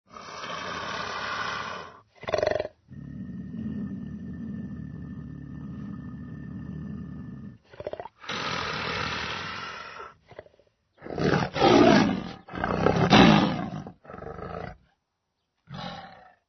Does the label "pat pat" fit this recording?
No